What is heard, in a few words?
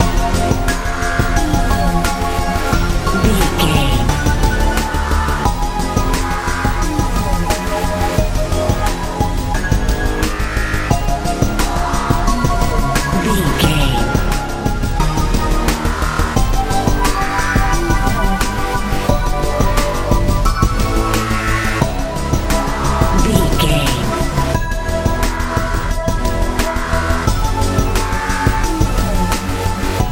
good happy modern dance